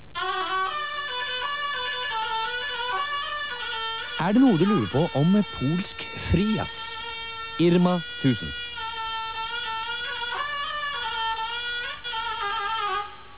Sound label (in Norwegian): polsk frijazz